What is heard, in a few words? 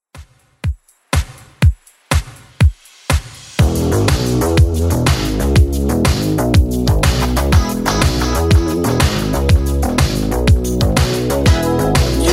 80s Dance Redrum 122bpm